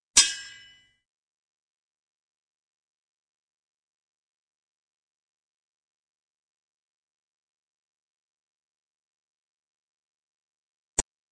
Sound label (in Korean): Fights, 싸움-전투; 헐리웃특수효과음